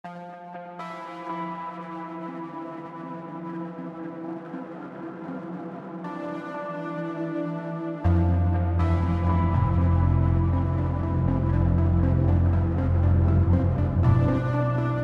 corroded wires, A BEAUTIFUL GIT; We simply put a Telecaster through a special delay and programmed another tape delay with; CINEMATIQUE; KLANG

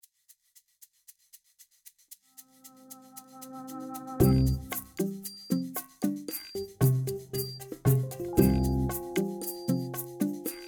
banjo contemporary

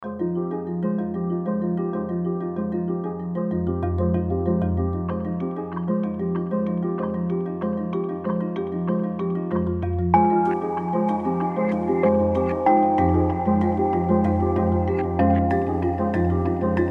kalimba

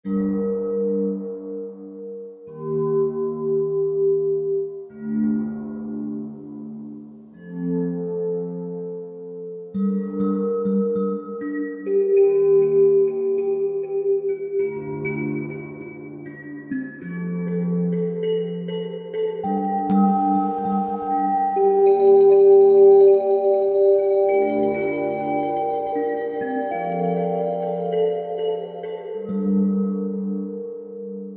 kalimba deluxe; This instrument's small and delicate essence comes from CI's Kalimba.We stole the noble Su; CINEMATIQUE; KLANG

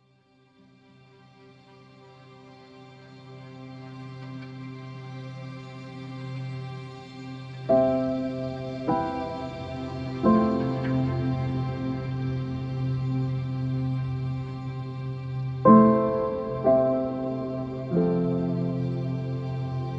violin

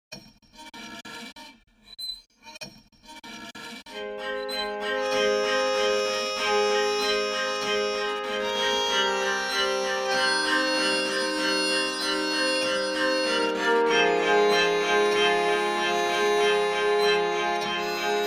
psaltery

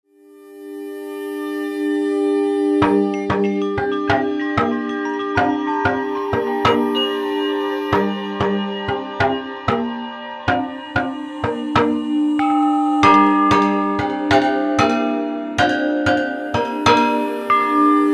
java mallets pop

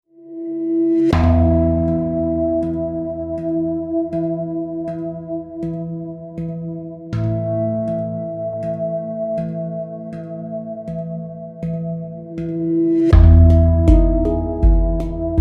pandrum

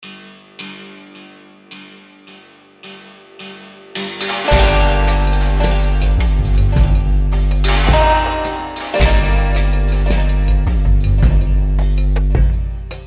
santur

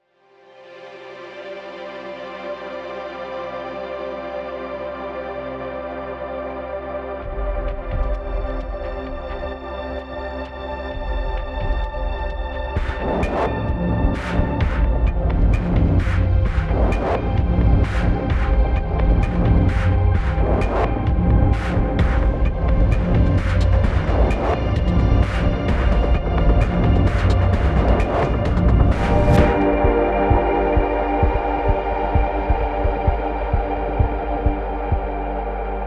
beats

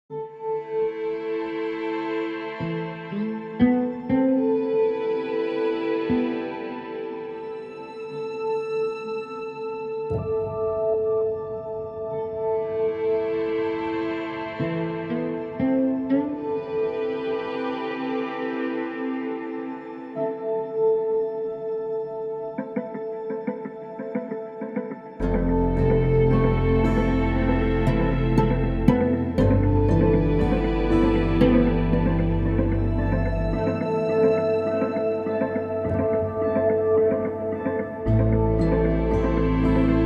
oud wheels

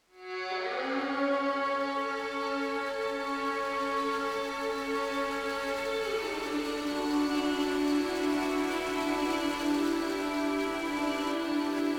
violin

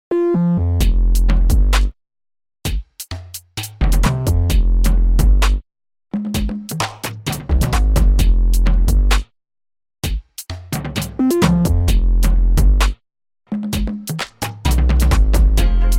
808 bass, CLASSIC BASS; CINEMATIQUE; KLANG

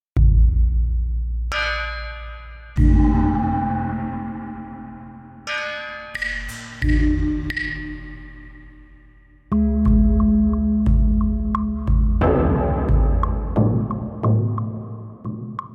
perc shaman

